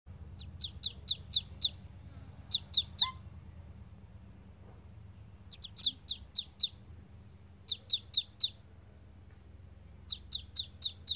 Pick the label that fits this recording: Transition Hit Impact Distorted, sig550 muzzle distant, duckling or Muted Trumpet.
duckling